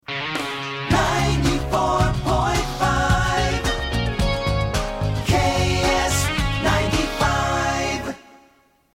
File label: Jingle; KSTP FM